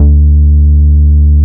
JUNGLE BS D3, JUNGLE BS

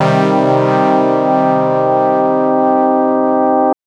Synth FM 2 Op Basic 2 min c3; TrashLibrary; FM2OpBasic1

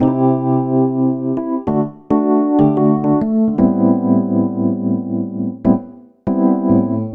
Electric Piano; Dr. Fink's Funk Factory

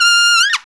Trumpets; Club-50 - Foundations Roland

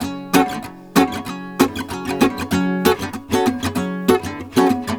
GUITAR, Balkanish Traditions

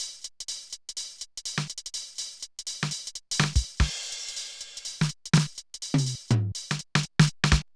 Loop, mxr; classic machines

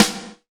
Snare AMS, Snares; Roland L-CDX-01; SNR Snares 3; SNR Sn Modules 3

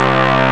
super brass; Amiga Soundtracker Sample Packs

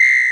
Korg N5 Rock Kit

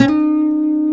E 4A, Guitars; Spectrasonics; Hans Zimmer Guitars VOL-1; MARTIN HAMMR

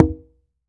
H CONGA, CONGA

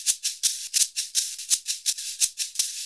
SHAKER, Modern Percussion Loops

